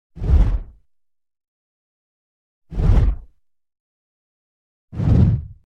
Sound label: Low End Ripple; Hollywood Edge Sound Designers Tool Kit